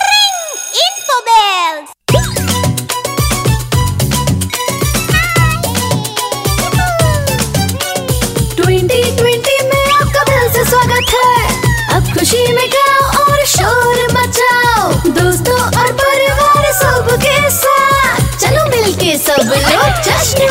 happy new year song children ringtone

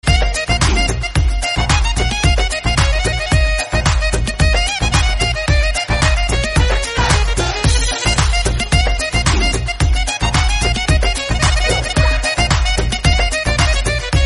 arabic background music tone ringtone

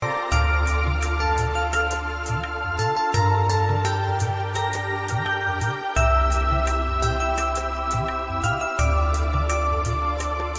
romantic valentines ringtone

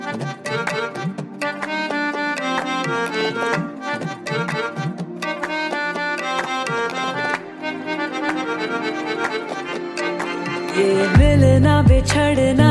hindi ringtone, bollywood ringtones

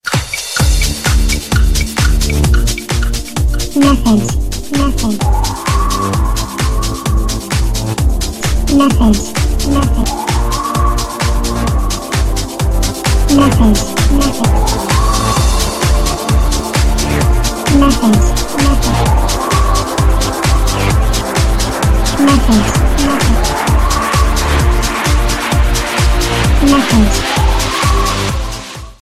message tone ringtone

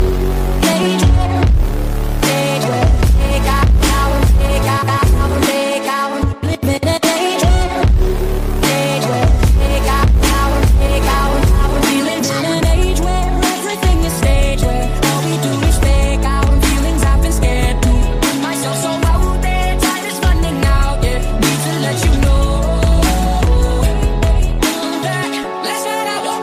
english ringtone

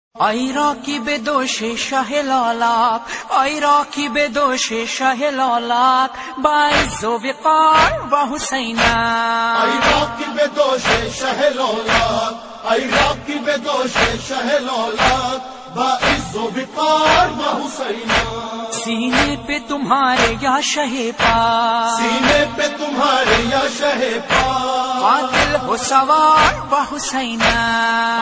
noha ringtone

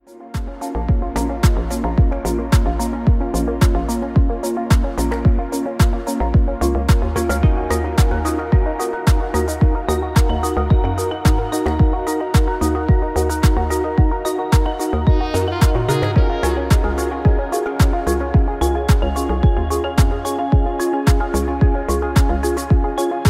ringtone english ringtones

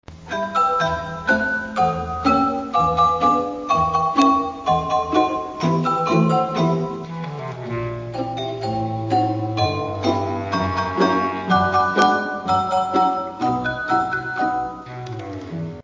classical bells music call tone ringtone

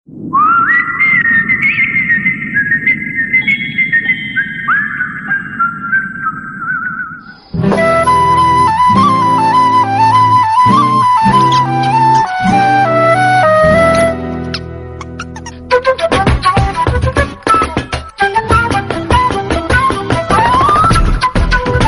cool rocking whistle contact tone; nokia ringtones

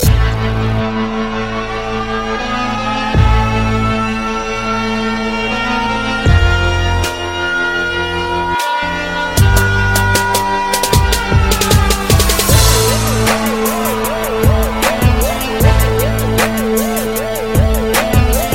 hip hop alarm ringtone

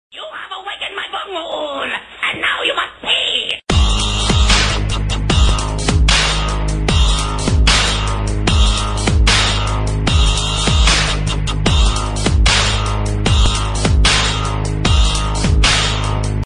dubstep

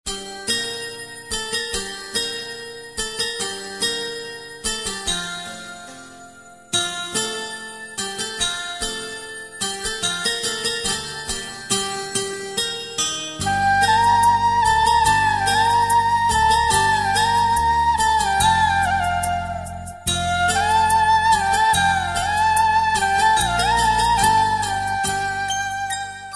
flute vs piano ringtone

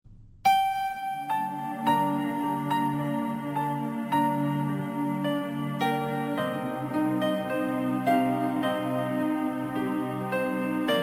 sad night ringtone

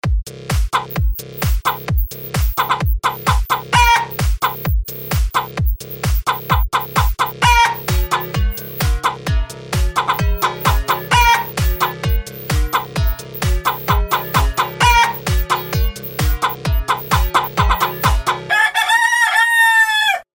funny rooster call ringtone

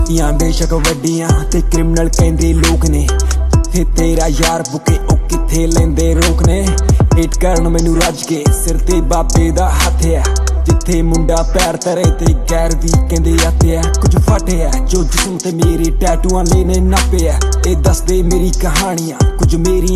ringtone bollywood ringtones

